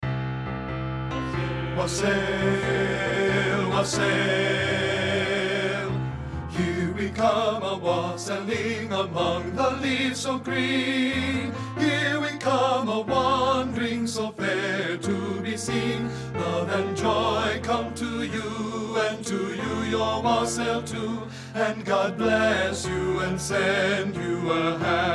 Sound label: Here We Come A Wassailing bass; men; partbypart